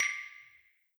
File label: person new small